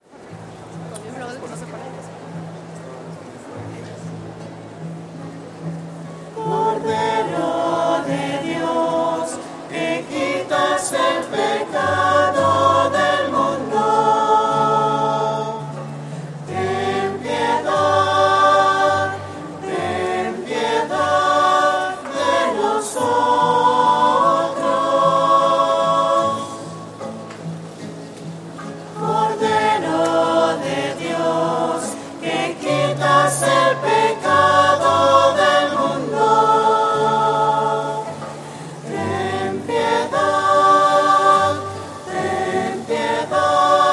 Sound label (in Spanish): cordero de dios voces; misas 2012